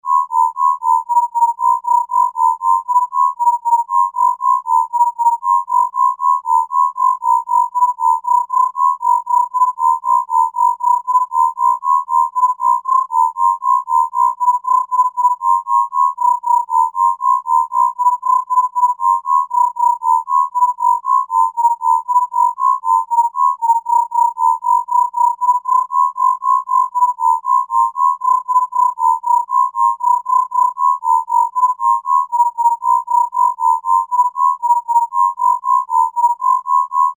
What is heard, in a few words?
throb